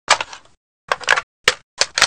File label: machg cock, weapons; kingpin